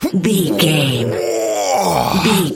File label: voice male effort long